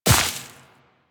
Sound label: Step Veg, Footsteps Walk; DE; Warframe; Tenno